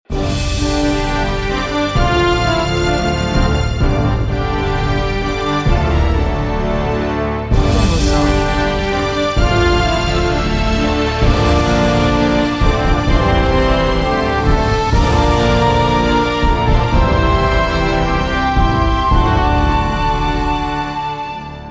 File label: Happy Majestic Epic Power Ending